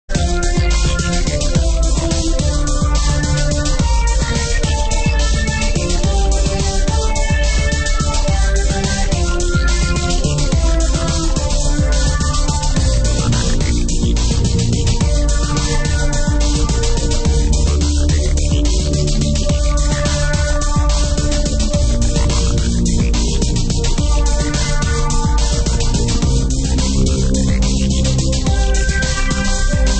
Trance Mix; Lightforce

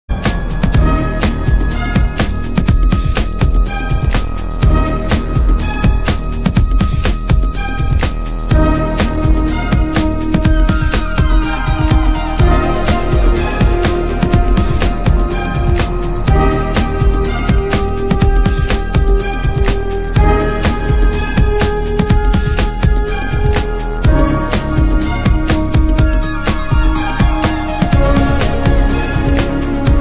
Orchestral Bouncy Remix; Wizball

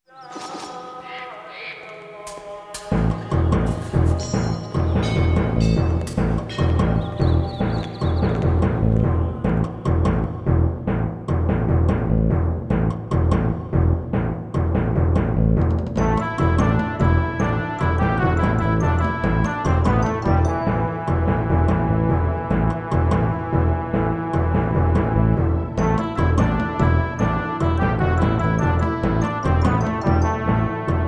Orchestral; Barbarian

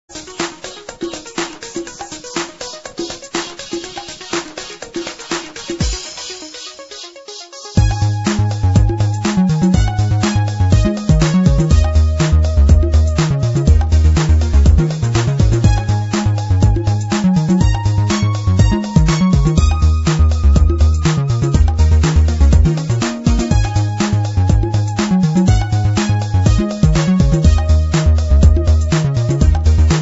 80s drummachine remake; Noisy Pillars

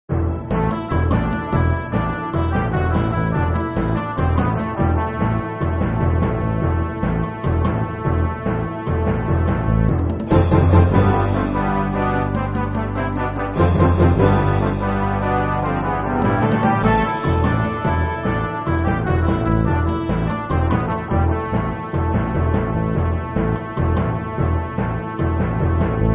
Orchestral; Barbarian

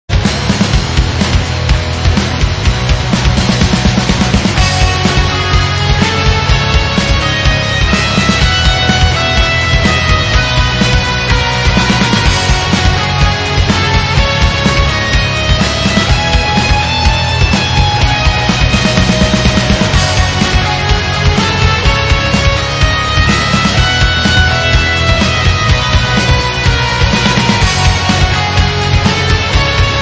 alt. rock; Last Ninja 2 - Central Park Loader

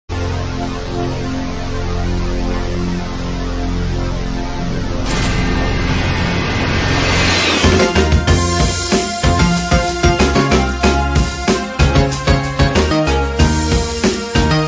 Jungle Remix; Platoon Level 1 Combat Zone

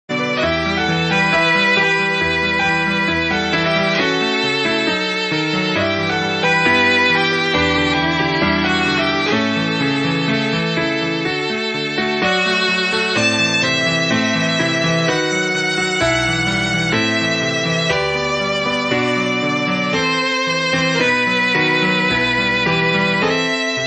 Tune for Piano and Violine; A Kastely

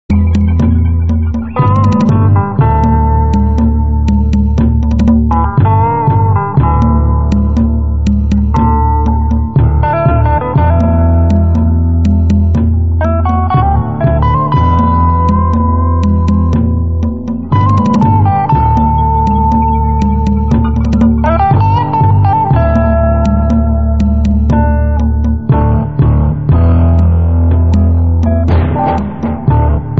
cavernous bongo mix; Fist 2

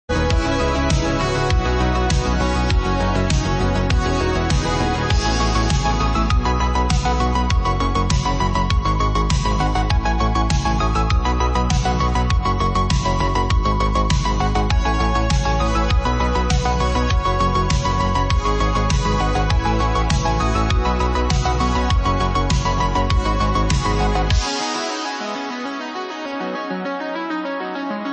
Synth; Target Renegade

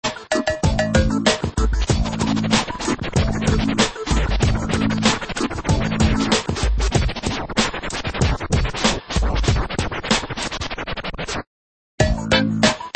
Beat O Bongo mix; Congo Bongo

